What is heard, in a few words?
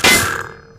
sawblade stick, metal; physics; Garry's Mod; hl2